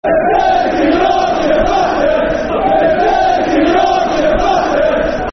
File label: Dirty Yorkeshire Bastards Football Chant; Crewe Alexandra Cafc